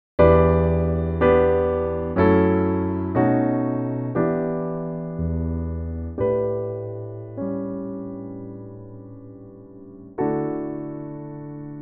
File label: Piano